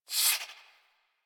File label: Swing, Melee; Weapons; Balance Two; Warframe; Modular Melee